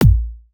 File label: hardbassdrumz, breakcore; Bazzdrumz